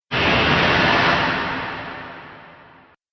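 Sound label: Science fiction Horror Impact; Sf Horror Impact; Miscellaneous Impact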